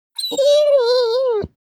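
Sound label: animal dog German shorthaired pointer whine trill; gsp; smartsoundfx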